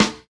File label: sharp steel snare drum G sharp key, snare sound tuned to the G# note; snare drum samples